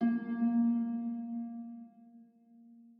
harp

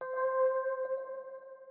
organ

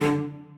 strings

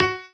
piano melo

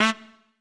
Trumpet melo

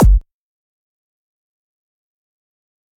Dr Kick

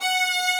violin

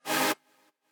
chord

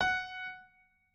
piano

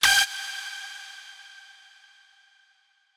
lead

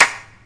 clap; BanG Dream; 爱音

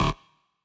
bass

